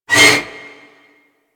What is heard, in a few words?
Frame Movement; DE; Warframe; Vay Hek